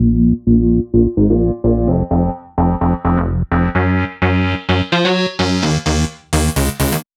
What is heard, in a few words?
Bass Sum Filter, 128 BPM; Vengeance Samples; Vocal-Hook Kits; Song Kit; Keep Believing